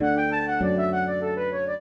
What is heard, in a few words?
trio, flute harp; Mozart